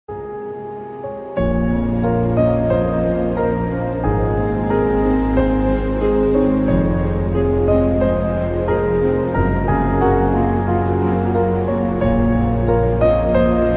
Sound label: BGM ringtone